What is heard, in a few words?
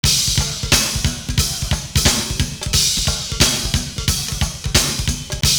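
double bass drum, loop; Univers Sons - Kitch 70s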